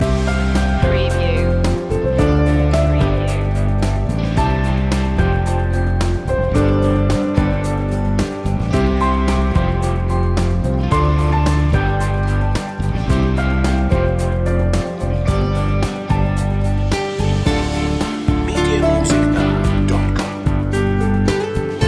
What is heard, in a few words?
easy drive loop